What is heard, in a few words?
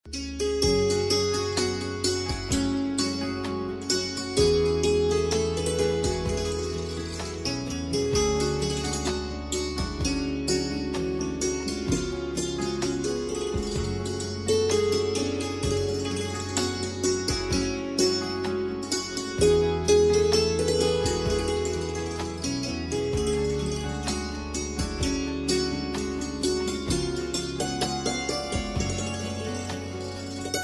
Santoor Mix Instrumental; Yaariyan; Bollywood Hits (2014)